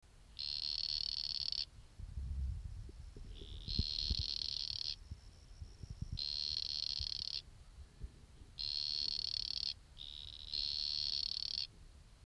Scinax exiguus, amphibia

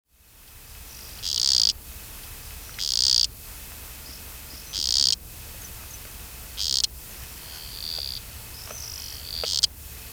Blommersia Kely, amphibia

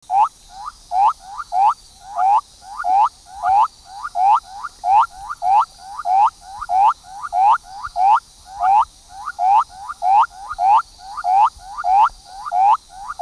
Leptodactylus fragilis 1 indiv, amphibia

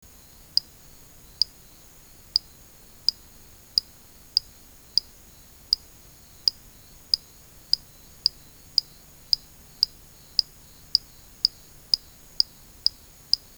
Eleuthe auriculatus; amphibia

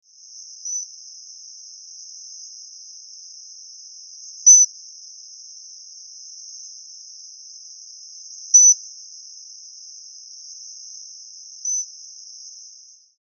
Stumpffia Pygmaea, amphibia

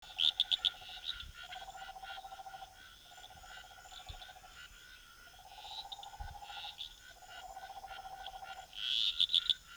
Dendropsophus gryllatus; amphibia

